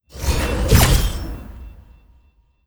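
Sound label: circle attack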